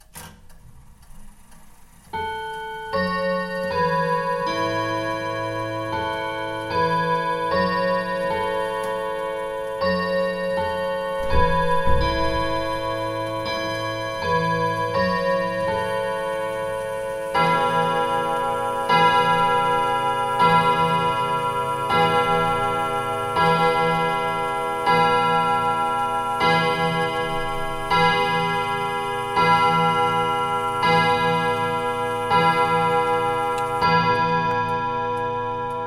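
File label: pendulum clock twelve strikes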